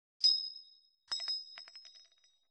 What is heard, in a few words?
40mm shell drop; Titanfall 2